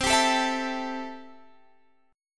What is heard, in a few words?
C 6th C down Guitar Standard; C6 strummed